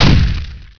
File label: bam; objects; Heretic II Demo